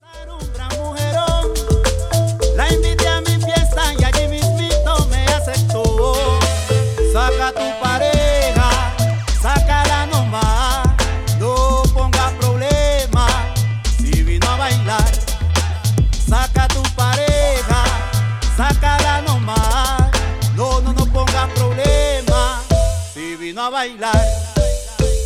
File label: Dub